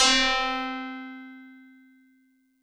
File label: Tubular Bells DX100 C4